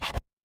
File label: transition record scratch